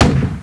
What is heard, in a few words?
m1 grenade firing